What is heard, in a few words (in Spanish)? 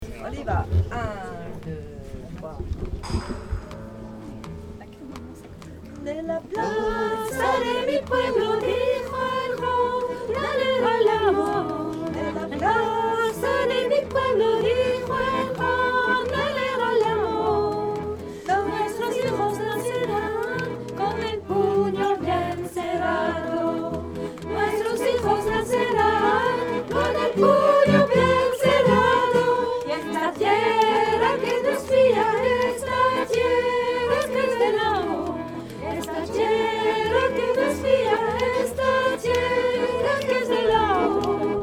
sopran; En la plaza de mi pueblo